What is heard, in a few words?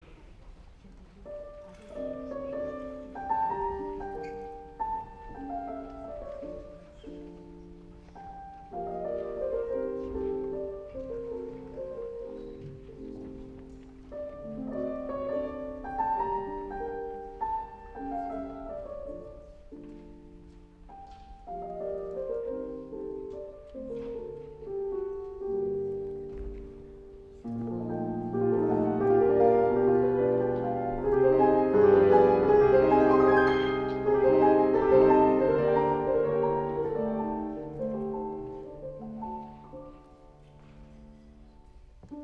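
Mazurka, piano; Cremona; Scriabin; Op.3 No.3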